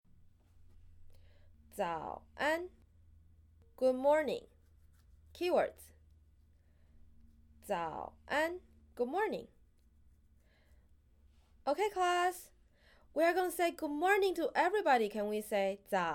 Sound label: Good Morning Chant Mandarin; Rituals